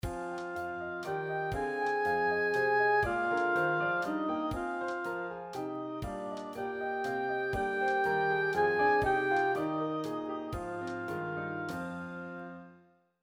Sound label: louder softer oboe high hrn